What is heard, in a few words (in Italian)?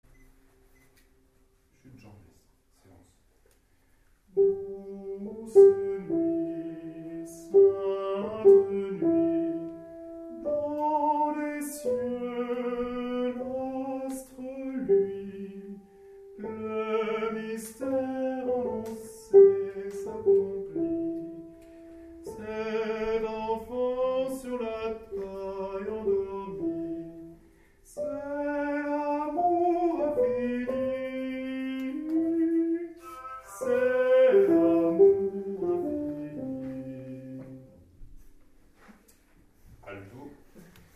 soprani; DOUCE NUIT